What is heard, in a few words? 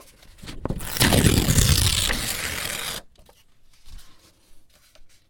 slow paper rip; Mike Koenig